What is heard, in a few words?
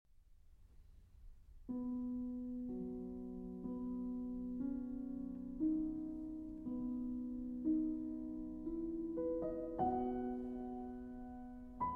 Transcr. for Solo Piano; Ave verum corpus, K. 618; Liszt